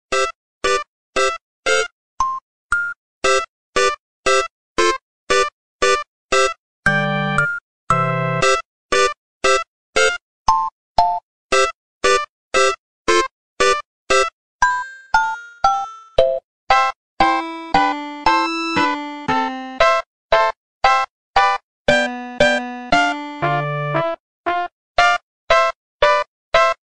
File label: game music